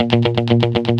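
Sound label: GUI 120, guitar; Best Service Gigapack 2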